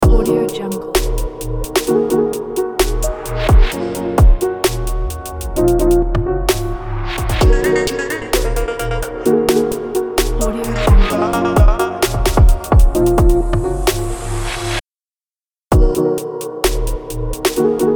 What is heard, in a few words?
dream logo